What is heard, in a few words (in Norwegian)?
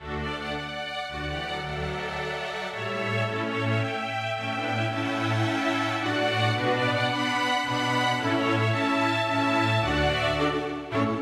Menuet for strykekvintett, u trommer; med ad lib trommer